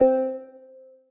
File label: Crumar Piano, String Machines, Crumar Orchestrator; Ableton; Factory Packs; Retro Synths